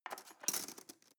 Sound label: cutlery teaspoon drawer scrape